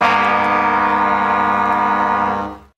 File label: Stabs